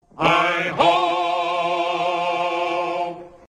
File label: Snowwhite